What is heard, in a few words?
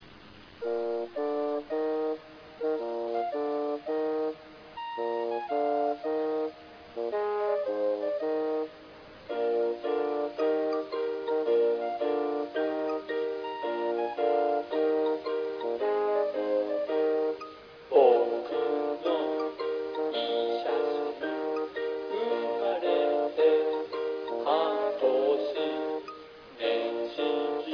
taiko